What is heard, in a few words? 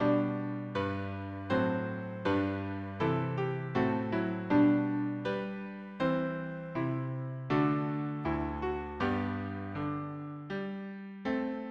harmony piano